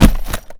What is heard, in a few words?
IED Drop; zps; Weapons; Explosives